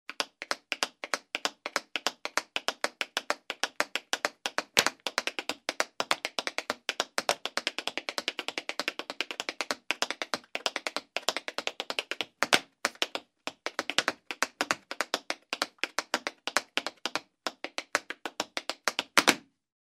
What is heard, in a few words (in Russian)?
zvuk chechetki